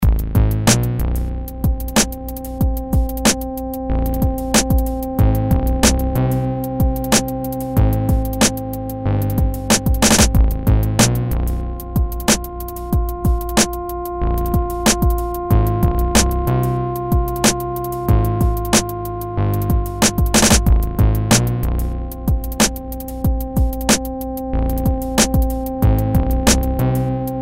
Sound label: Darkness No Chimes; Chuck's Zombie Petting Zoo; Marr0w Productions